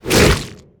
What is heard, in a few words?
BOSS Atk